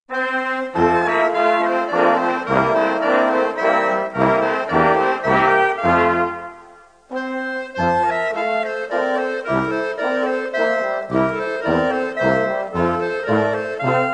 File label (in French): Landler - Autriche